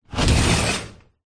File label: door sliding glass open